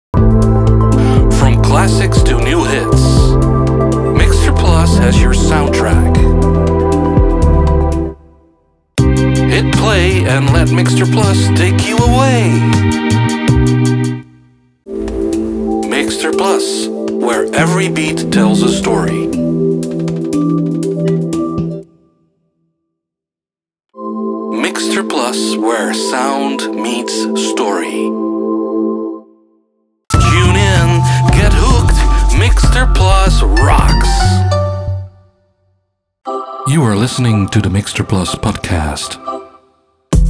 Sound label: Jingles